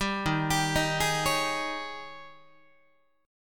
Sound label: D sharp 11th D sharp, Guitar; arpeggiated; JGuitar